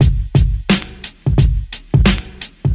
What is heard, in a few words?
Drum loops, Boombap